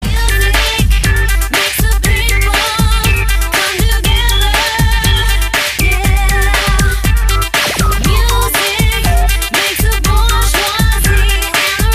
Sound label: music